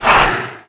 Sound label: grunt soft, antlion; creatures; vox